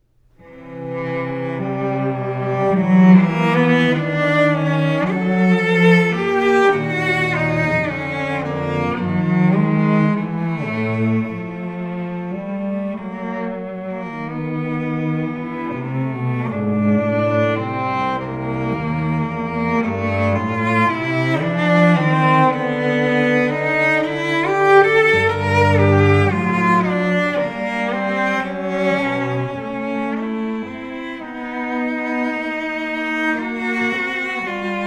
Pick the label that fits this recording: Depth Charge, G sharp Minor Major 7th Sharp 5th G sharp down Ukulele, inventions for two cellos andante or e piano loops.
inventions for two cellos andante